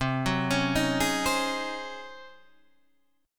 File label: Db Major 7th Suspended 2nd Suspended 4th, C, guitar